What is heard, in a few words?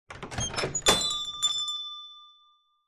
shop door open bell ring